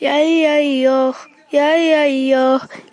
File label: yai yai yoh, female singing; VOCALS and SPOKEN WORD